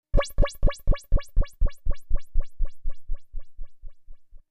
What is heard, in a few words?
delay